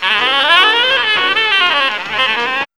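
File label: SAX RIF, sax riff; E-Lab; X Static Goldmine 1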